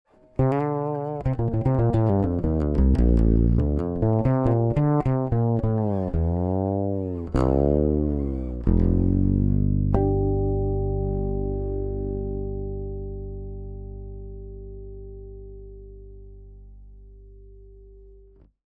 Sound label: soul aktiv piezo, bass